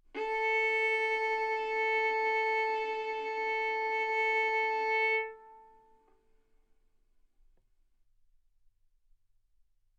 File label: Violin arco A4 B4